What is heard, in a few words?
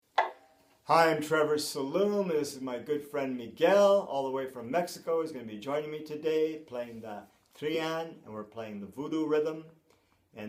Rada Drum; VooDoo Rada Drum